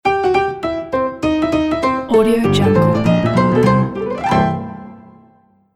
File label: cartoon intro; logotunes